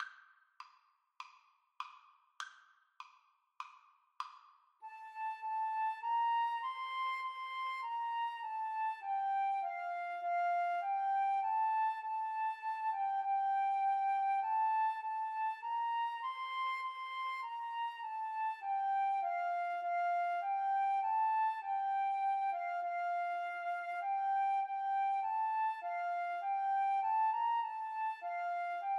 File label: ode to joy, Flute Duet